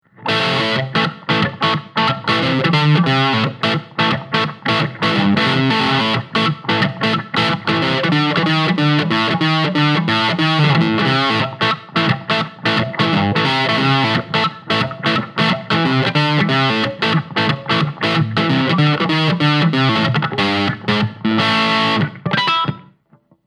Line6 Spider V 120MkII Crunch

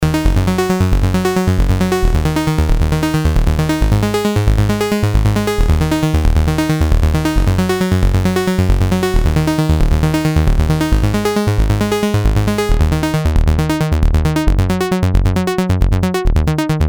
Filter 0 24 dB

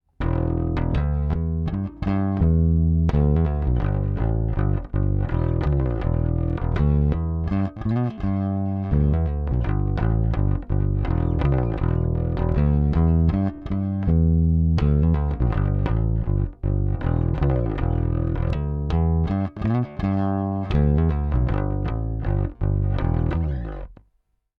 Amp DI

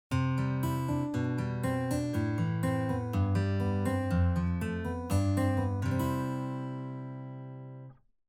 Guitar dry

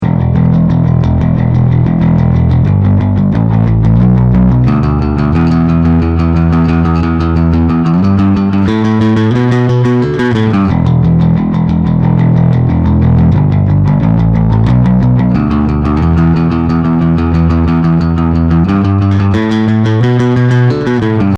Peavey Headliner 1000

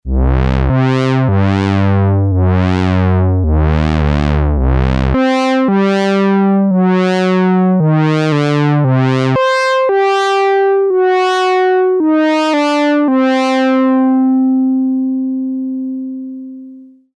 Osc PWM

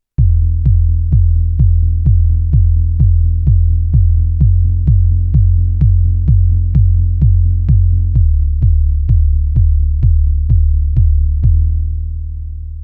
Modal Argon8

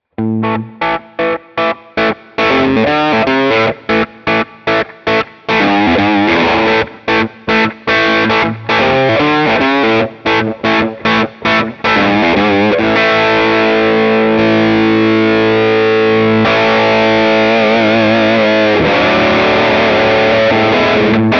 Drive 1x12 Classic Ceramic 2x12 Boutique Twin; Scuffham Amps S-Gear; The Jakal

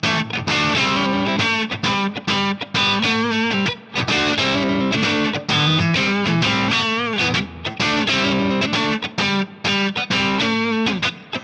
Reverb Spank USA; Guitar Rig 7; Reverb Delight

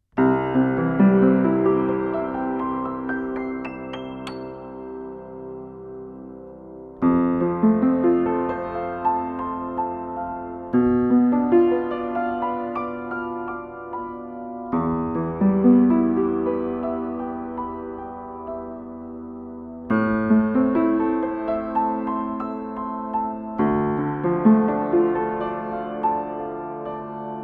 DPA 4060 Piano Mics Inside Open lid

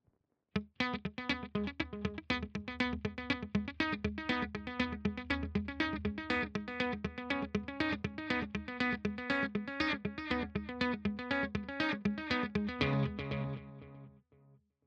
Riff DualDelay

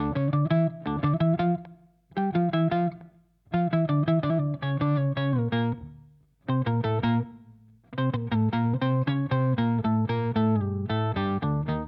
HX Stomp Octaver; Vergleichstest

